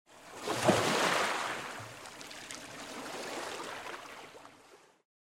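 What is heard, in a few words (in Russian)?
мягкая волна с откатом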